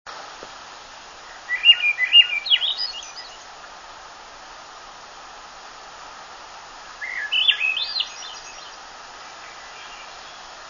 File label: thrush swainsons; birdsongs